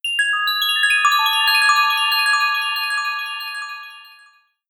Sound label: Spell, iPod sounds; i OS